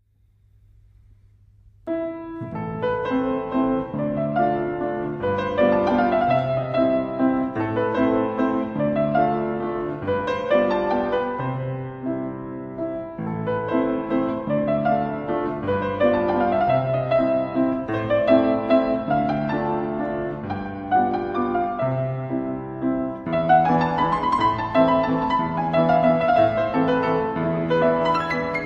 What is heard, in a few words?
conrad graf fortepiano